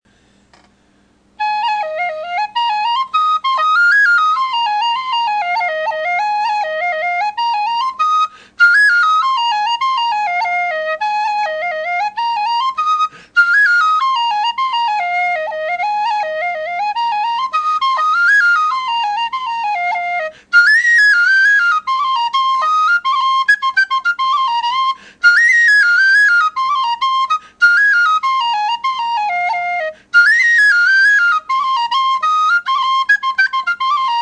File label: water weasel eb